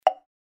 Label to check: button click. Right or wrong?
Right